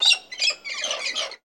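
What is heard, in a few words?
Cockatoo Roseate Chirp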